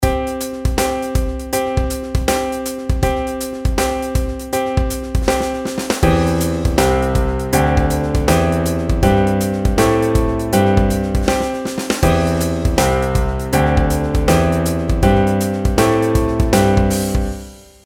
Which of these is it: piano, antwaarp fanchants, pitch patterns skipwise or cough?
pitch patterns skipwise